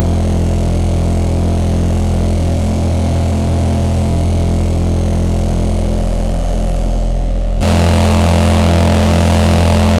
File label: industrial spaceship gene